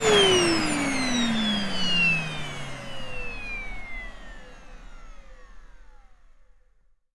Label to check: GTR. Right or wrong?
Wrong